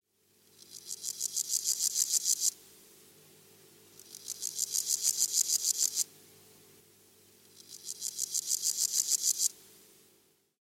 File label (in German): Gewoehnlicher Grashuepfer; Heuschrecken; tierstimmen insekten